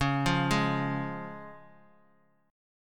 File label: C sharp Major; arpeggiated; JGuitar